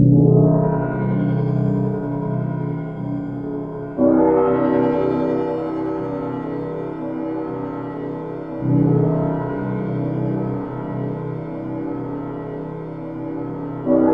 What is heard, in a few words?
LOW BELLS, MYSTERY GLASS; Zero-G Ambient 1